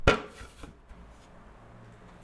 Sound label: ladder touch